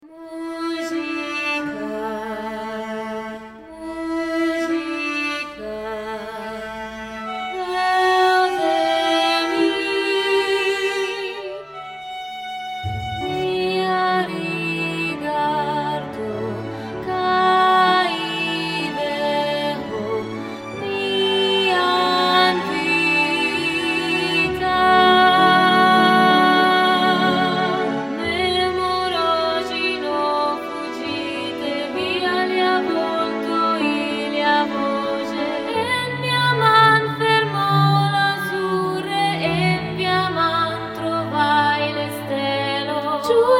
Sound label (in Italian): Musica